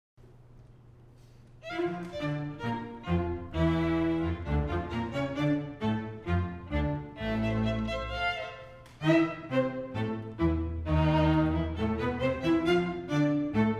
Divertimento for Clarinet, clarinet and string quintet